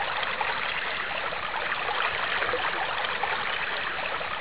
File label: water